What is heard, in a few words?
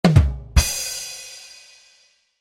comedy drum roll